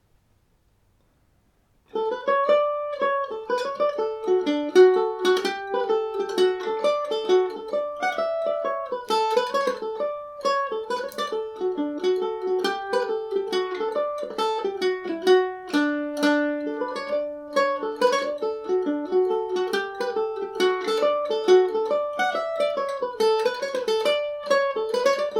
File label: hornpipe, irish mandolin; Honeysuckle Hornpipe; 4/4; D Major; G&O #34 mandolin